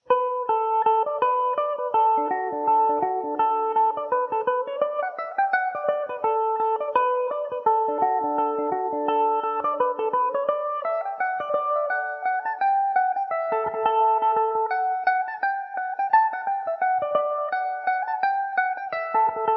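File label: D major, Irish mandolin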